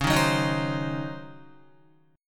Db Major 7th Suspended 2nd Suspended 4th, Db, Guitar; 8,6,6,8,6,8; DbM7sus2sus4 strummed; JGuitar